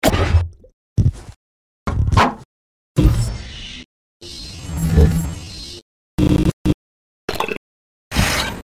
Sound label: percussive noise mix